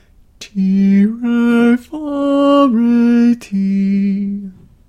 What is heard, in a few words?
Diminished Triad